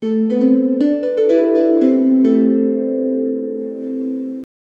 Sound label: wind calms gentle harp; closure